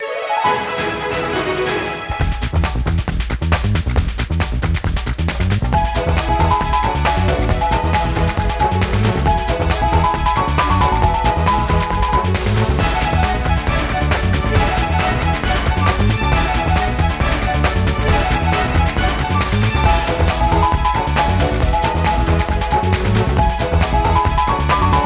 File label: Mixed-Up Scramble